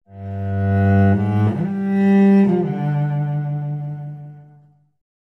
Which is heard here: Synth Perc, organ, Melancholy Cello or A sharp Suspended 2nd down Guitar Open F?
Melancholy Cello